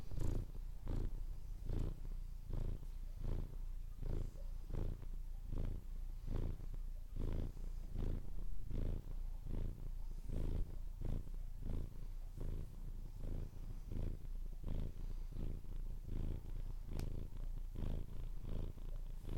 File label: my cat purring; sound 365; smudge